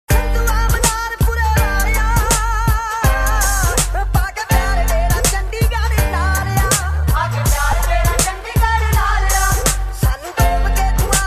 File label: Broken Indian Music